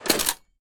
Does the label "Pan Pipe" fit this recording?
No